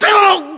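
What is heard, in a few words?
death, male; localized english; voiceovers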